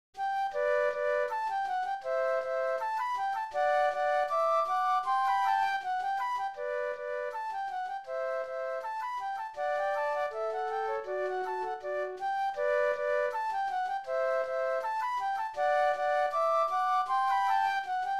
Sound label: Flute Duet; classical